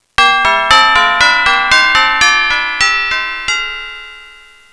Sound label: midbell